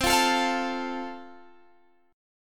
C 6th Suspended 4th C, chord

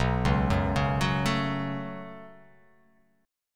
B 7th Suspended 2nd; B7sus2; arpeggiated; JGuitar

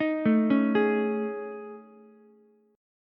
A Major 7th Flat 5th, Ukulele; AM7b5 arpeggiated; JGuitar

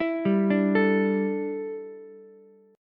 E Minor 11th Ukulele chord, C6; Em11 arpeggiated; JGuitar

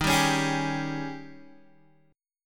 D sharp 11th, Guitar, DADGAD

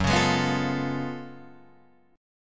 Gb Minor Major 11th Gb down Guitar Drop D

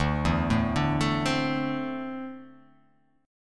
D 7th Suspended 2nd down, chord; D7sus2 arpeggiated

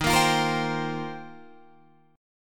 Eb 6th Add 9th, Guitar, Drop D

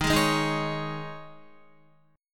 D sharp 7th Suspended 4th, Guitar, chord; D#7sus4 strummed; JGuitar

